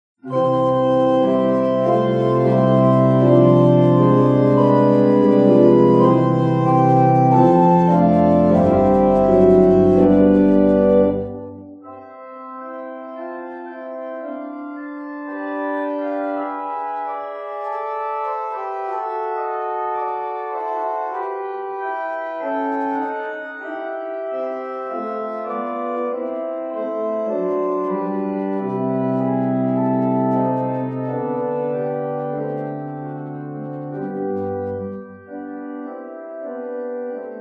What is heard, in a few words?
prelude in c major